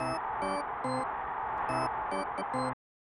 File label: Organ Theme